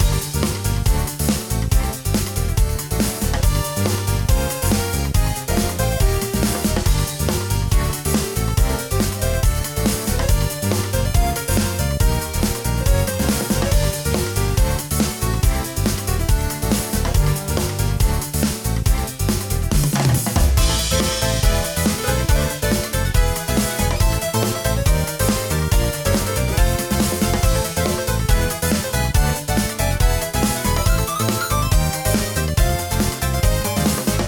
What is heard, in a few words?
pixel sprinter loop